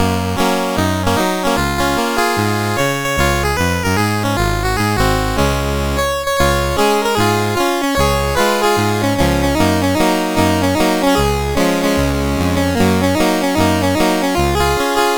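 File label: Low Down Blues, MOS CSG8580R5; Tom B24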